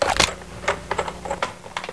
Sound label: m249 boxout; cstrike